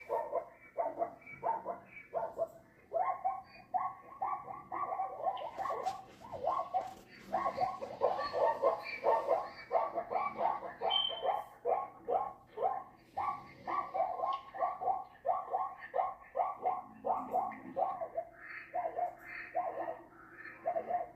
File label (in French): Zebre braie